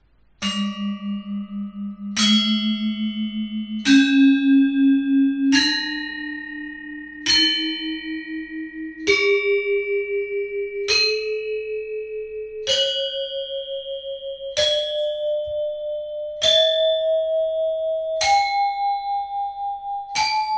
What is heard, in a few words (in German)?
Gender Skala